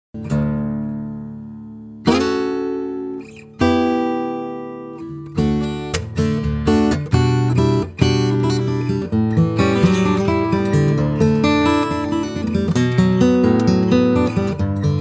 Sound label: Guitar sample